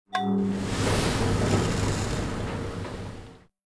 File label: Granary; Buildings; Civ4 beyond sword